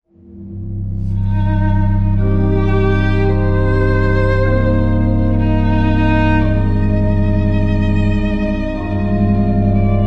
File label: violin organ